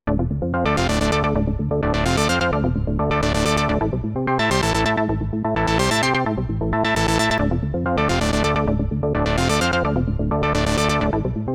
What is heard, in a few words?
Brave Arp